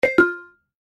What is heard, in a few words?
Chatroom Leave